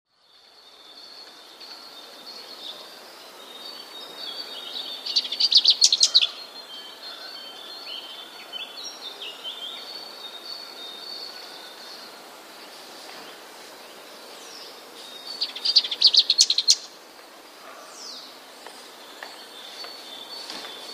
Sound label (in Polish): piegża